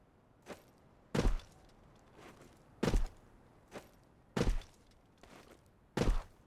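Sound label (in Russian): jumping sound; zvuki cheloveka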